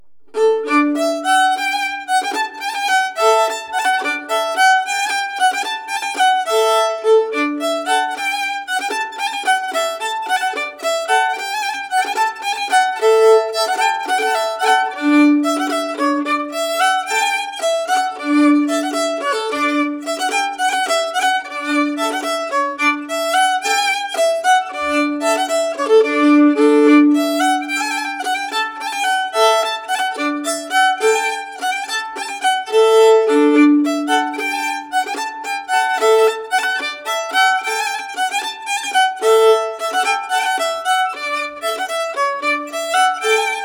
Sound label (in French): Bourree